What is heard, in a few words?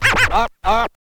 scratch kit, vinyl